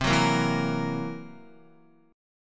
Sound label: C 7th Flat 5th C down Guitar; C7b5; strummed; JGuitar